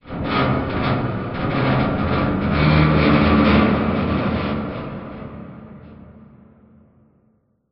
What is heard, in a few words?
amb apocalypse wronk, ambient city